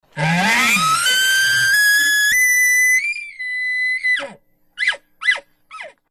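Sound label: bird call decoy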